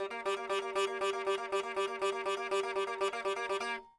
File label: sitar